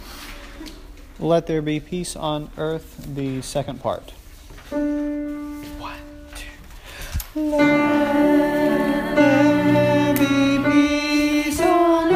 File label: Alto